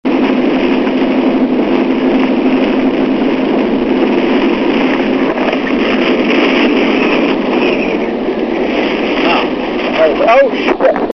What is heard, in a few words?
wind, storm